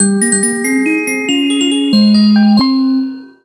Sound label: Level Success; Secret Mine